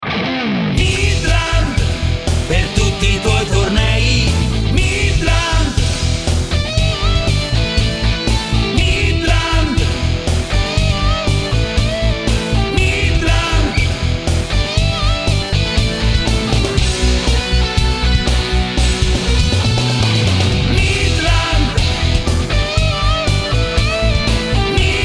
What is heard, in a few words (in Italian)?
jingle; Midland; Midland Global Sport; tormentone pubblicitario; suoneria dedicata